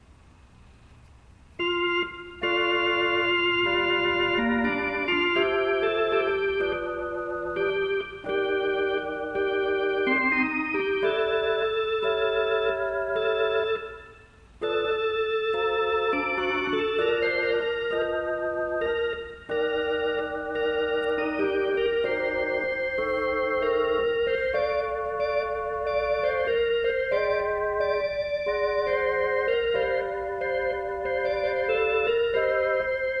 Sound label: playing a lovely old valve hammond